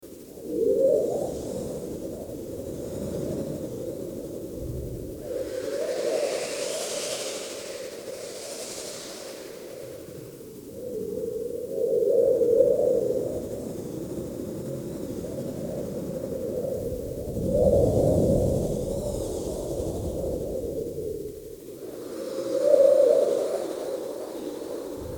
desert wind

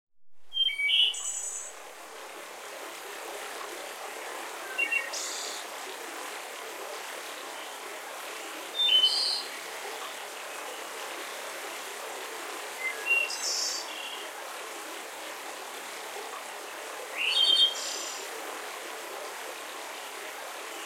mountain forest

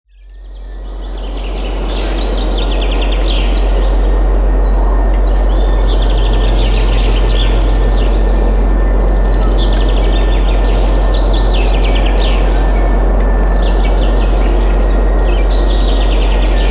ambient spring forest

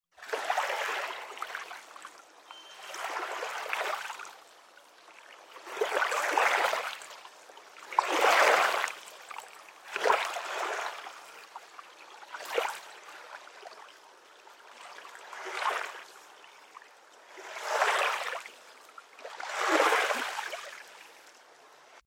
lake lapping at the bank; sea

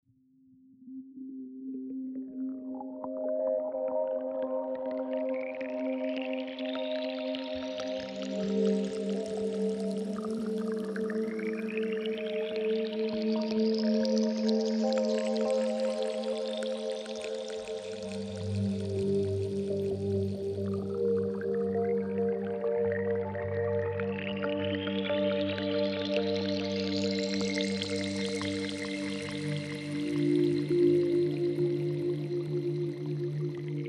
relaxing pads loop

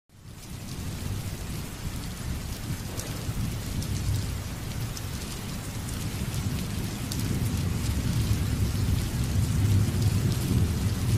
rain and thunder

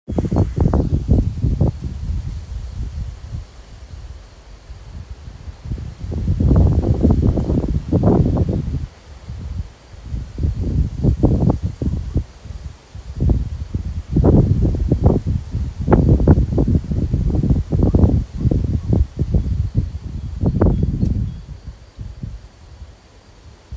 cold strong wind sound effect

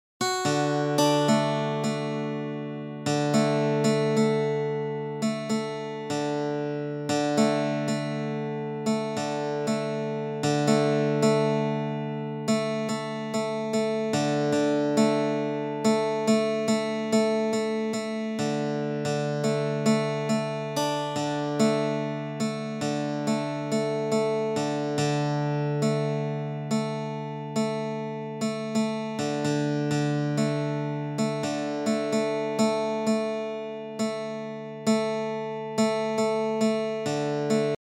relaxing guitar music

